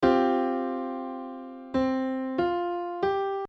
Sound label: Csus4